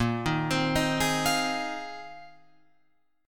A sharp 7th Suspended 2nd Suspended 4th, Guitar, Open F; A#7sus2sus4; arpeggiated; 10,10,12,10,11,12